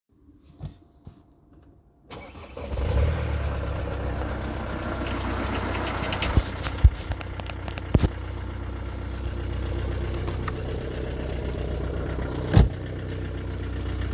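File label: mercedes w205 diesel rattle cold start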